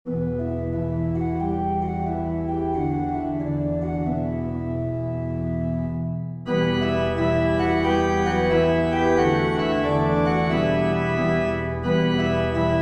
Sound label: Organ